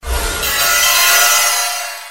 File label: Jewelry Dice